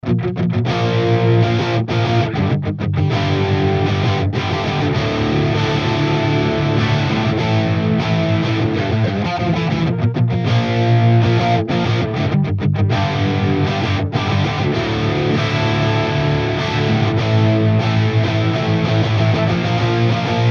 axe 4 guitars

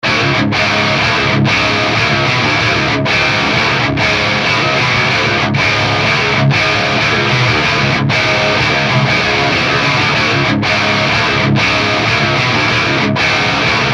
GTR

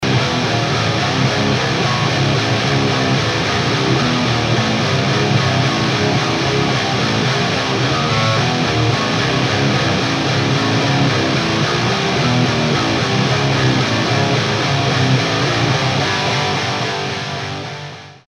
COBRA GIT 4

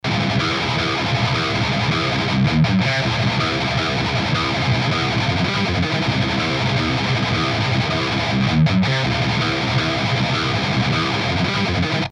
slo 100 one; revv

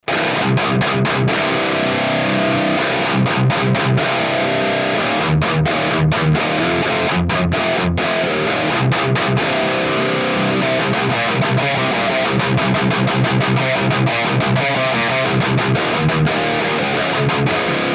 HIGAIN

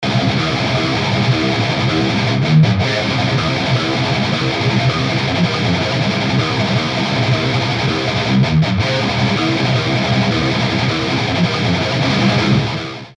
4 tracks, revv

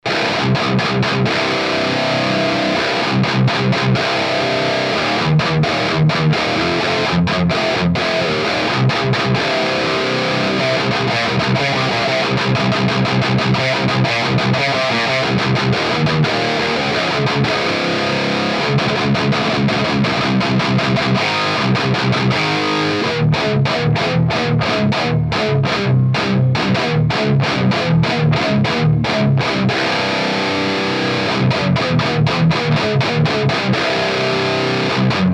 HIGAIN

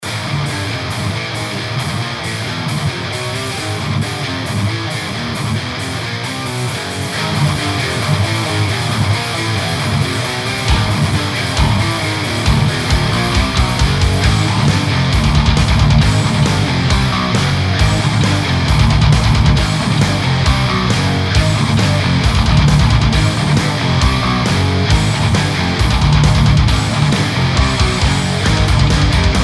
MIX REAL

